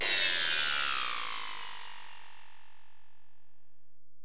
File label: Ride, Alesis DM-PRO, Drum Machines